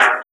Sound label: Vinyl Snare; Sonic Foundry (Sony Creative Software); Esoterik Beatz; Vinyl101 Kit